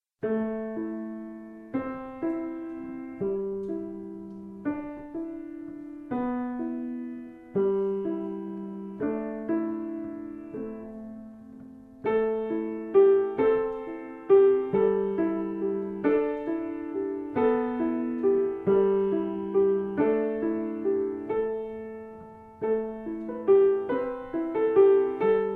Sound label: Lullaby